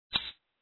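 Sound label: percussion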